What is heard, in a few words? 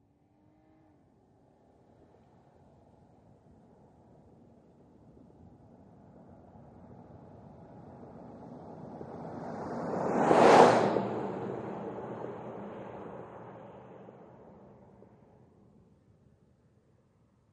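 SUV By From L To R 55 mph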